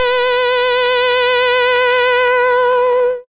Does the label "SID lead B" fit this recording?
Yes